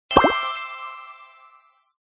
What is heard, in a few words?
fish kill small